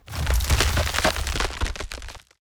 wall crack

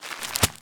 wad money

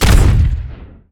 bigaxe

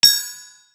atk triangle

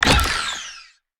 atk batons heal

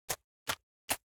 paw footstep

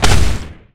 shoulder cannon

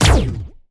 funnel

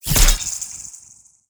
p atk seasword

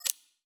ui unpick

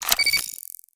atk rifle cast, player

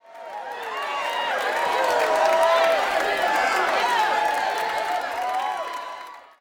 cheer street

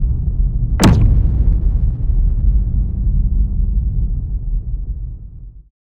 beam amb

